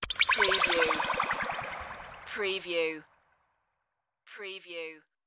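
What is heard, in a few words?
Mystery Bleep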